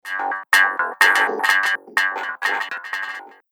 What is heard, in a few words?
Twang Sound Garbled; Twang Sound Effects; Cartoon / Game Sound Effect; Twang Sound 4; Andrew Fleming